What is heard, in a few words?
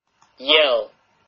yell